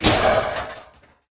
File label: death giant damage, monster